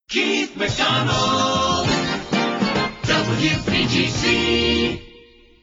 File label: jingles logoset; priority one